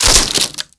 DMG TREE

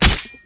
AXE

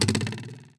hit

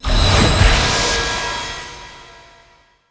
Clear Poison

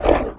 Hit

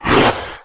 Attack

